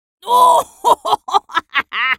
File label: laugh; Character Voices; English